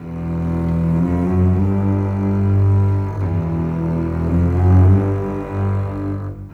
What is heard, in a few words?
Bass Cello, Cinematic; Sonic Foundry (Sony Creative Software); Orchestral Series VOL-3; Cinematic 17